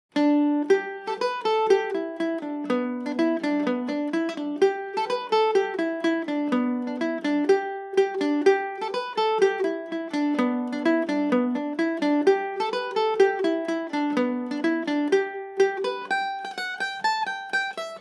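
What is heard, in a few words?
polka G major, Irish mandolin; John Walsh's Polka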